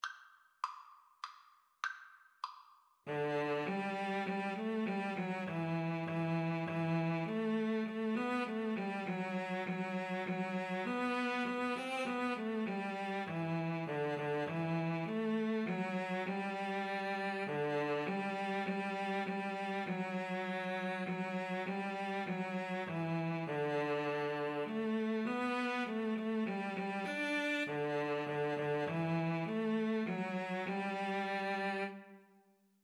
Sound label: Cello Duet